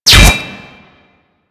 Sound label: Bolt Impact Metal; Warframe; Weapons; Cross Bolt